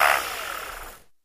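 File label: Motor Small Low Speed, variable speed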